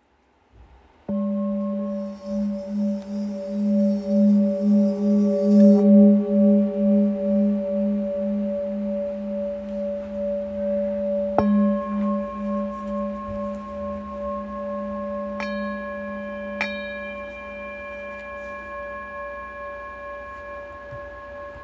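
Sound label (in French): Anciens sol dièse, bols chantants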